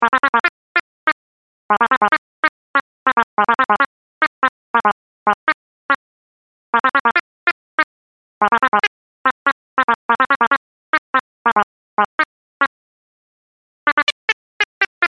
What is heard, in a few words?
secret sound; chirpy reverie online gamerip 2021